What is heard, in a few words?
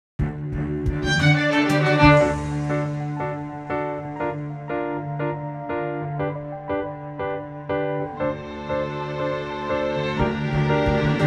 Season Of Us Underscore, Classical Cinematic; Narrative Mind